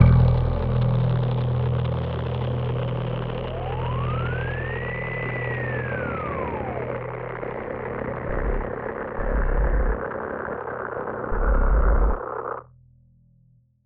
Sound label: Atmos Sting; musicradar